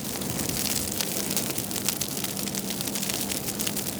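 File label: fire medium; emitters global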